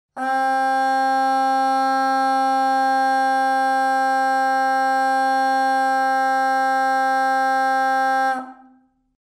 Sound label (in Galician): ronqueta